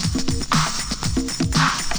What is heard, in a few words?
shuffle muffle